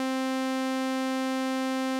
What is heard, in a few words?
OB SEM SAW